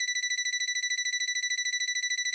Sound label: Ring